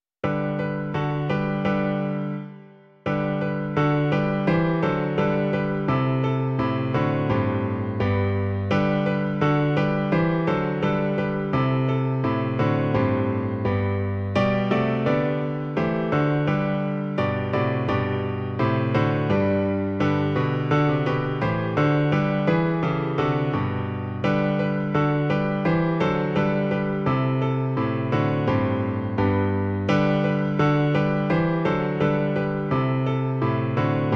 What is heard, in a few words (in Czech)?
Čas radosti, veselosti - klavír; Klavírní verze